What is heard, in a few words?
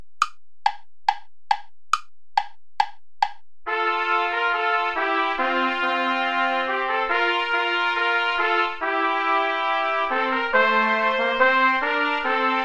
play along without trombone; praetorius michael ballet